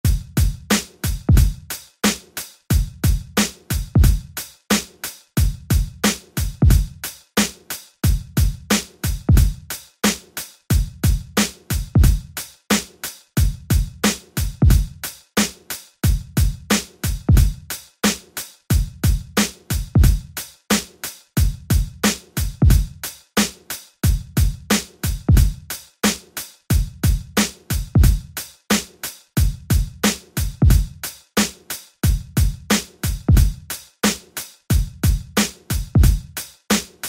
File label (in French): Rythme